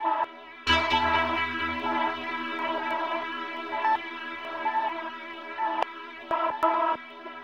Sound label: Synth Loop 129 Em